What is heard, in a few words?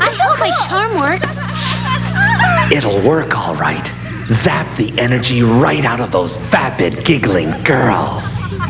giggling